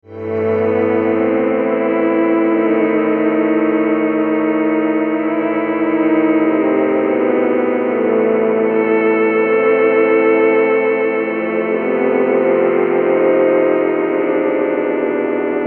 old strings

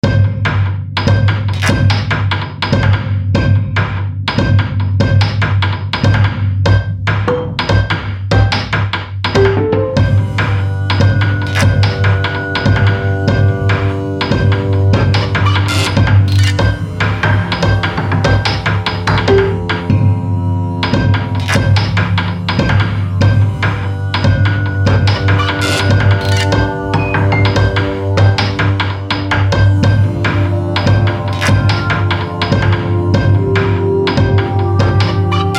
Piano

